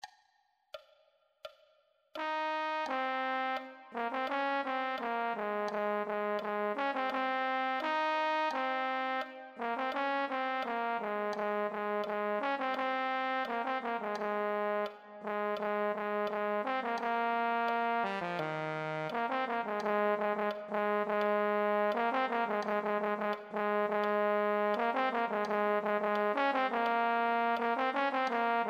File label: euphonium